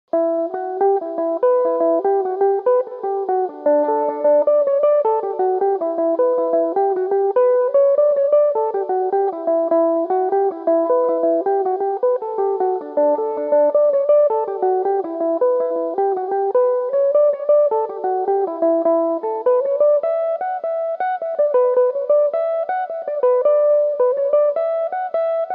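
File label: jig E dorian, Irish mandolin; Belmuse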